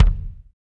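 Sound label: bass drum, Roland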